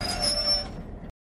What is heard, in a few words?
Loco Brakes Shrt Burs, brakes